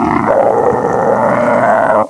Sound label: Deer Threaten